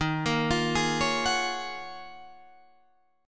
Eb Minor 11th Eb down Guitar Drop D; Ebm11 arpeggiated

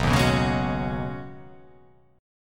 B 6th Add 9th B down, chord

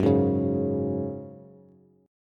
Gb Suspended 2nd, Bass; Gbsus2 strummed; x,9,6,6

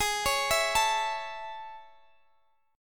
A Major Ab; A/Ab arpeggiated

